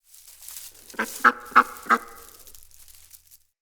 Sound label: Duck Idle Chatter; Encounters; Animal Rescue